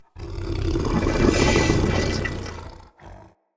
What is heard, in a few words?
Corrupted Battle Dog Idle Growl; Animals; Vocals